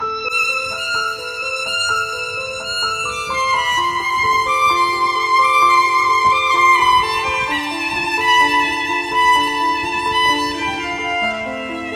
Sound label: skripka